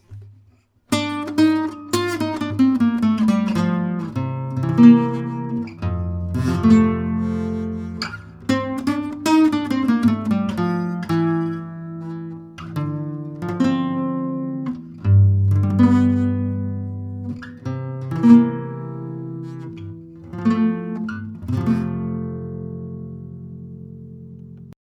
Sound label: Melody Chords